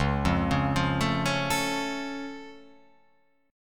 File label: C sharp Augmented down, guitar chord